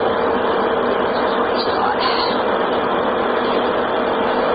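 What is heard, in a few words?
voice breath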